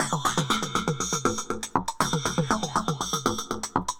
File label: Throatbeat